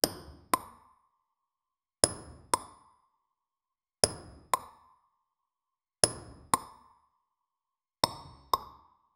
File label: successful item pickup sound, cave